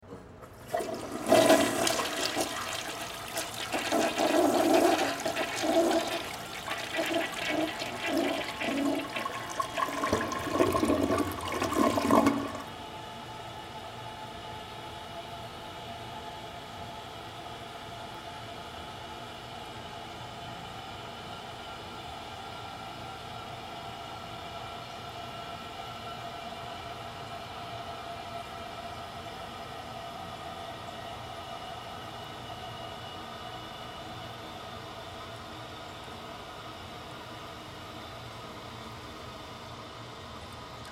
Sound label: Toilet Flush Long Bathroom Stall; Public Bathroom; Office; Blastwave